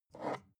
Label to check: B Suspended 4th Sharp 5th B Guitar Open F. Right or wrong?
Wrong